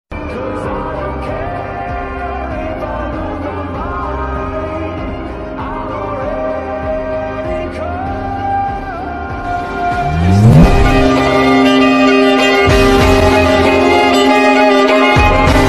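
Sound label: background music ringtone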